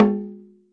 daibyoshi A1; ETHNIC and WORLD PERCUSSION

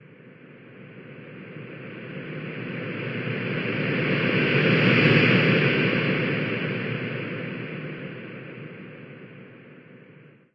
spirit voice; Atmospherics

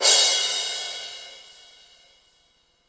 rs7000 hh cy d8, Yamaha RS7000 Cymbals; drum machines

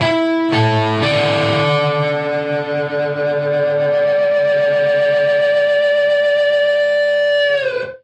harmonic dive bomb guitar

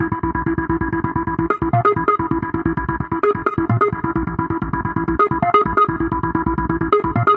dirty arp, synth and electronic loops

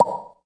analogue bubble; Weird and Interesting Hits

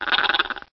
Scraper; Cheesy Lo-Fi Sound Effects

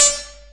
synth hi-hat; DRUMS (SINGLE HITS); Hats

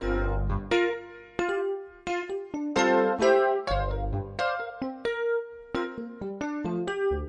126 new age piano funk; KEYS ORGAN PIANO LOOPS

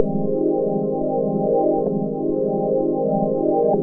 mks pad; Pads

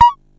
silly elec fx; UNUSUAL KITS and FX; Silly Electro FX Kit

